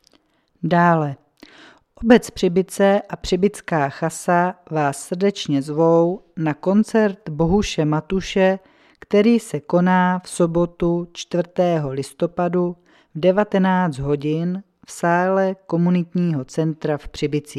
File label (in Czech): koncert; Přibice